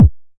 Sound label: 909 BD; Goldbaby Tape808 & Tape909; The Tape 909